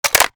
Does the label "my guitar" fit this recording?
No